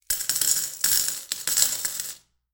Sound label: Coins Drop